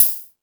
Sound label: More Cymbals, E-MU Pro-Cussion